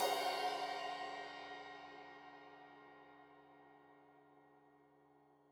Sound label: Crash 15in L edge, Cymbals Stereo